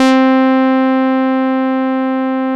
RAW SAW, BASSES

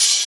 Open Hhat, HiHat; Equipped Music; Loungin House

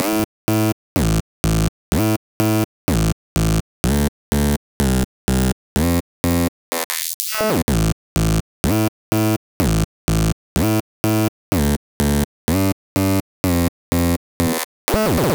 Bass, Afterhours EDM, Electro Shock; Sonic Foundry (Sony Creative Software)

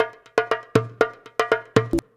119 DJEMBE, Modern Percussion Loops

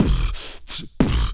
SLOBBER; Spectrasonics; Planet

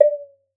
water; Xsample VOL-9

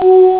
string; frightnight; Amiga Soundtracker Sample Packs

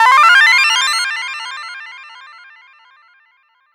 Breakdown FX, Underground UK House & Electro; Sonic Foundry (Sony Creative Software)

